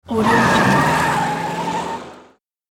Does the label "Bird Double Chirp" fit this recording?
No